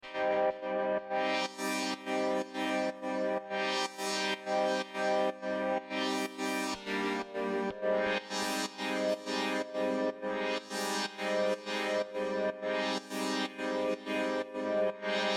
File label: auto sidechain pump wet; multiphonics cv 2 audio fx; adam pietruszko